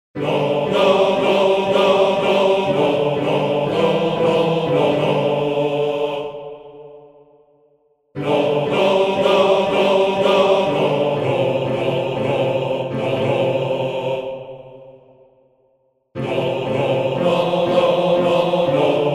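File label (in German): Bass, Männerchor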